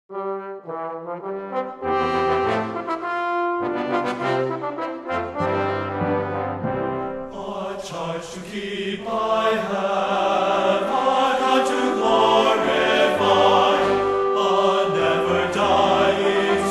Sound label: Chorale With Orchestra, A Charge To Keep I Have